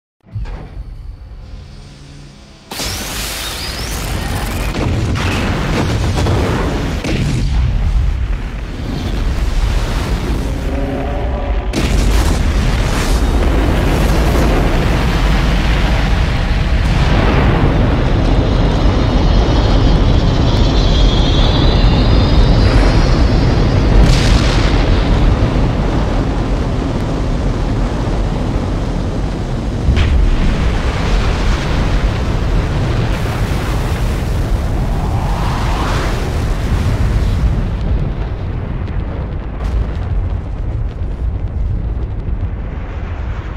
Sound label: space shuttle; پرتاب شاتل فضایی